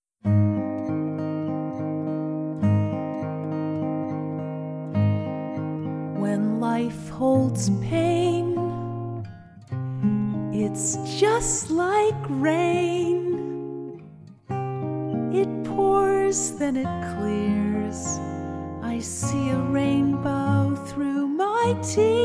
rainbow through my tears acoustic, guitar piano medley